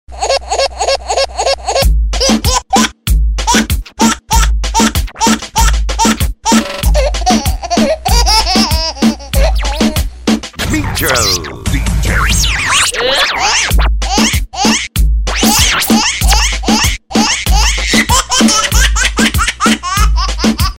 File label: Very Funny Baby